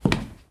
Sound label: Wood footstep; starbites